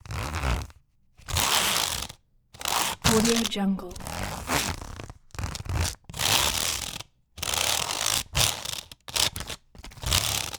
metal cycle metal cards; soundmorph

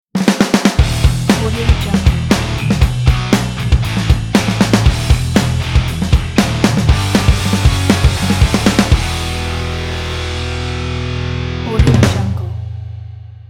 modern hard rock audio logo

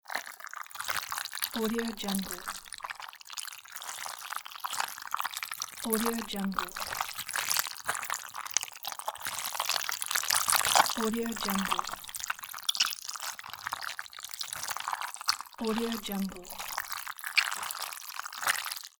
handling guts entrails

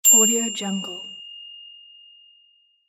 ding

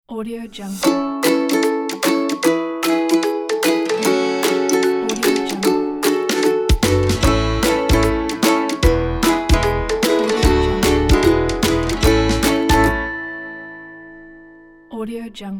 the happy logo

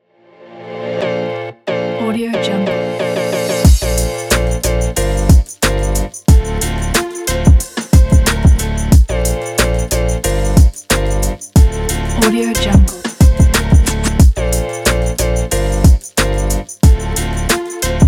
hip hop

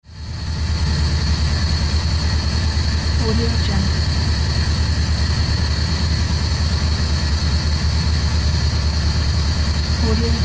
ship engine

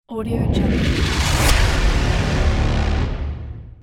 riser

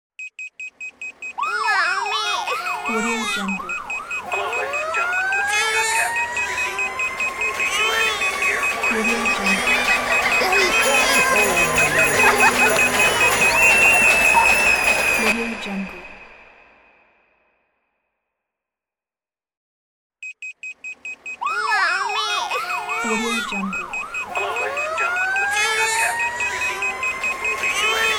noise build up riser

